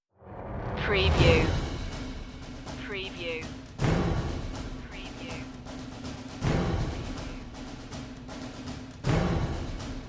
perc mix

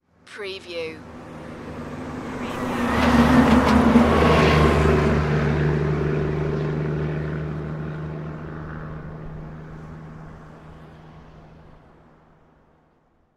heavy rusty truck

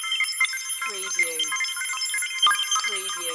Space Scifi Sound - communication

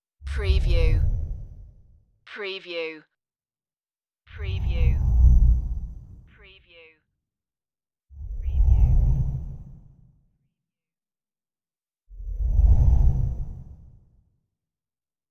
dark space flybys

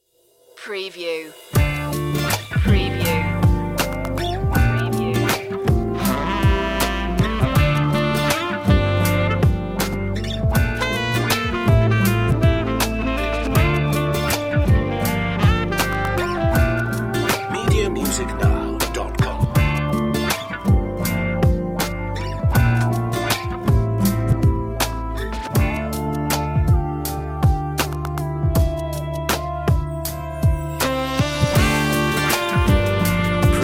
soothing soul

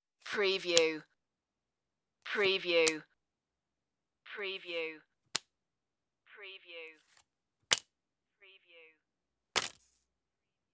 playing cards

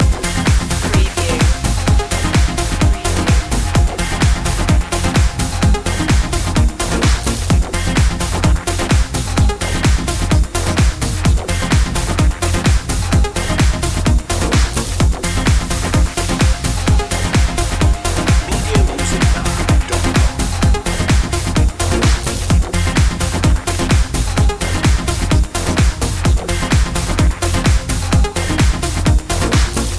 let go loop

